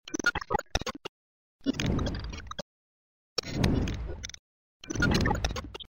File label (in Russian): glitch perehody